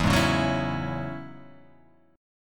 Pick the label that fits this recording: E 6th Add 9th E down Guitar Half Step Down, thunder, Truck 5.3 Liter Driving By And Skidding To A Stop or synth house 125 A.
E 6th Add 9th E down Guitar Half Step Down